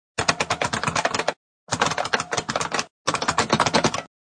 Old Kybrd Typ Qk, Fast hard typing on a computer keyboard.; Typing on Computer Keyboard Short; Classroom; Schools